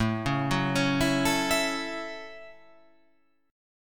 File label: A 6th, guitar